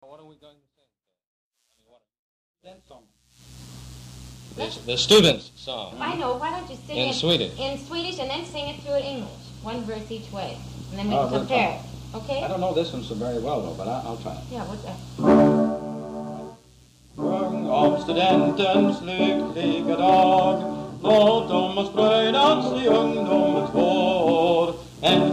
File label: Swedish English Song